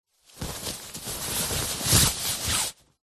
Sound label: flag handling movement; zapsplat